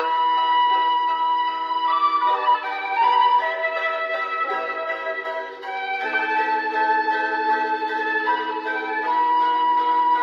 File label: flute; Phonky